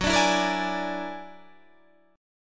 F sharp Augmented 9th F sharp Guitar Half Step Down